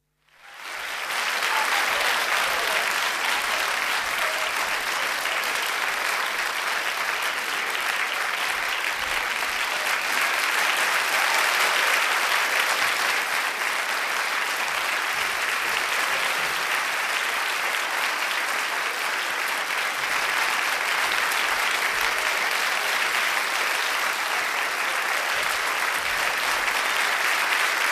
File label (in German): Applaus; Menschen